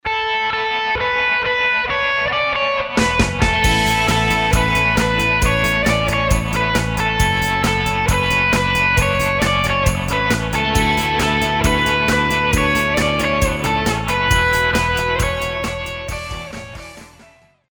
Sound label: music sample rock 100